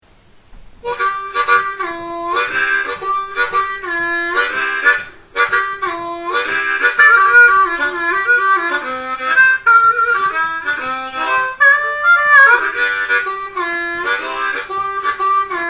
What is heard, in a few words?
12 Bar in G, blues harmonica